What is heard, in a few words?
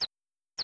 Chick; mother2